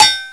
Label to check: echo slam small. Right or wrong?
Wrong